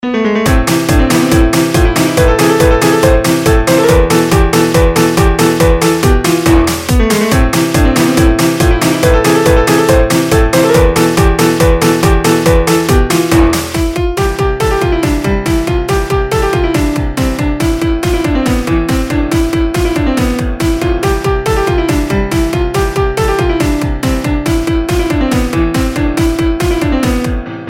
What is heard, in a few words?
Classical Techno